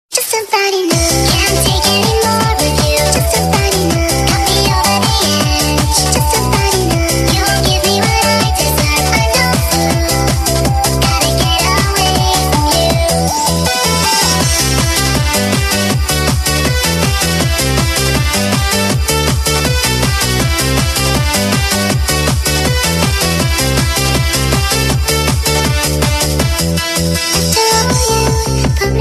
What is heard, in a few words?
disco